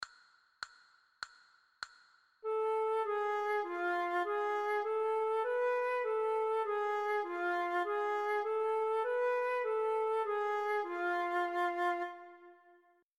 Sol diese, flute a bec

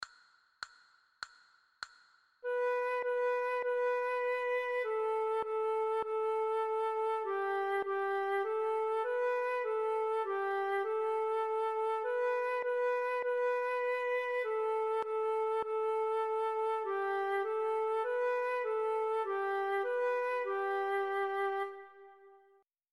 Petite marche, flute a bec; methode pour apprendre a jouer

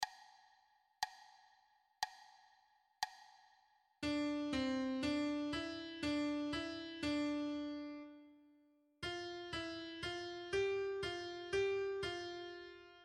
Doubles Broderies inferieures 60 70 80, flute traversiere

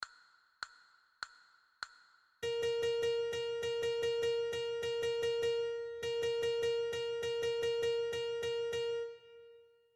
Si b, flute a bec

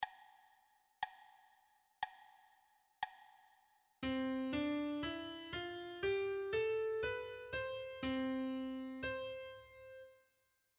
Octocordes Octave 60 70 80, flute traversiere

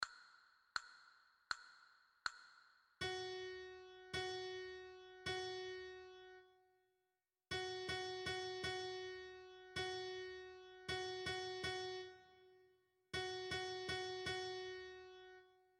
Fa diese, flute a bec

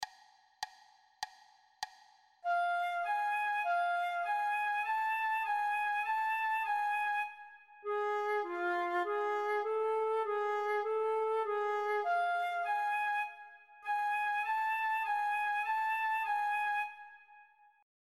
Sol diese, flute a bec

